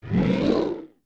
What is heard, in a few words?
Biri Lurk; zelda; OOT